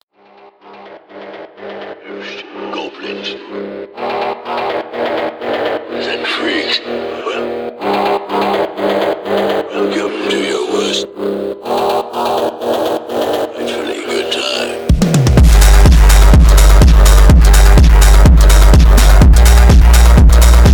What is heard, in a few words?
Phonk